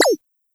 combo break

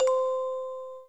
orgel

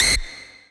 lead

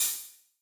Hat

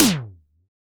drum hit whistle; osu

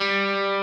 Arp.

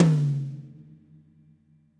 high tom; osu

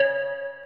Arp; osu

